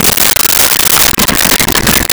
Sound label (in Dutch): inschenken, Thuis; Home-made